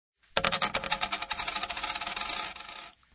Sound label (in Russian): zvuk monetki